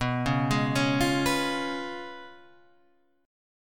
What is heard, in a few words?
Db 7th Cb, guitar; Db7/Cb arpeggiated